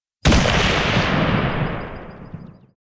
A Loud Gunshot In The Woods

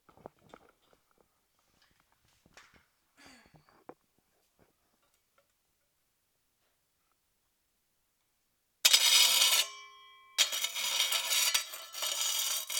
Scrapping

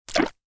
Slime Click

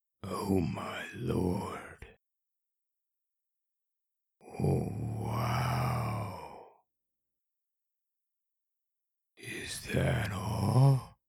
Amazed Gasps

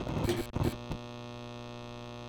Buzzing

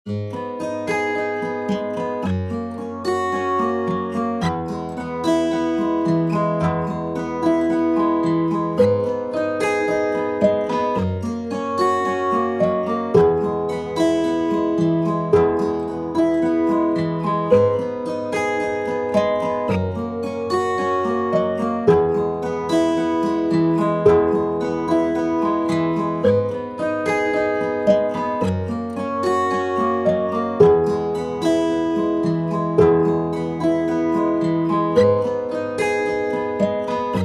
Soft Piano And Acoustic Guitar

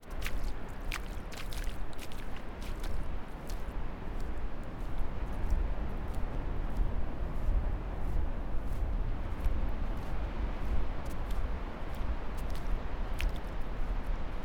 Barefoot Steps On Sandy Beach

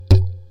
Djembe cheap mic session

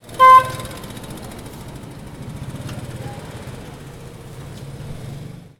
Motorbike Horn

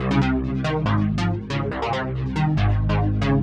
Synth

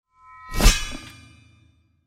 Reflect The Spear; Diablo

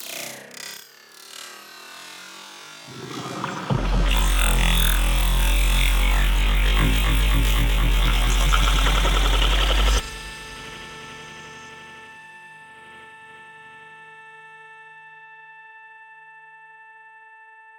Granualized Transform

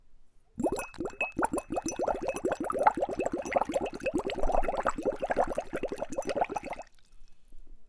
Water Bubbles Glass goblet

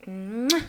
Kiss Female

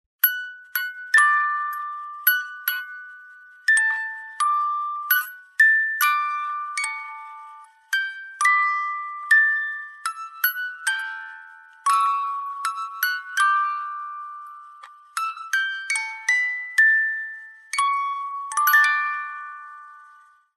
Slow Toy Music Box Brahms Lullaby

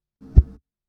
Short Swing Motion

